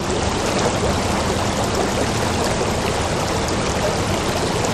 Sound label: River Slw Loop